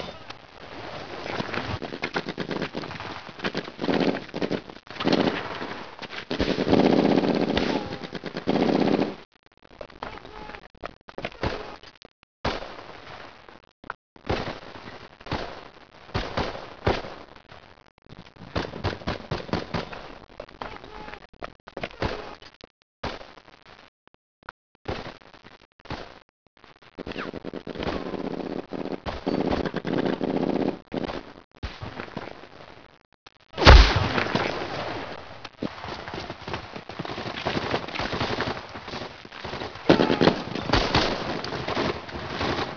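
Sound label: ambience battle; openffa; dday2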